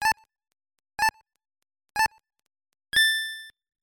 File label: Countdown; Tetris Attack; Yoshi no Panepon; SGB